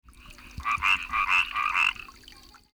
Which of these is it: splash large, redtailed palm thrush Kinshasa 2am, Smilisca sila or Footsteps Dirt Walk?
Smilisca sila